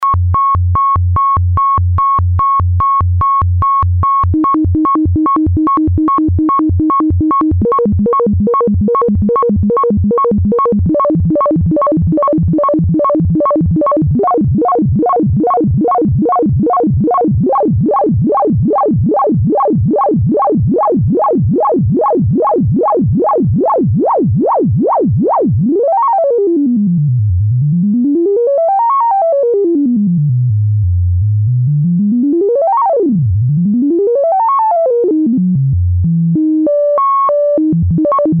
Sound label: stair up dwn; Quad lfo